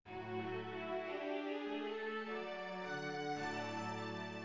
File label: Ring Leitmotiv, mid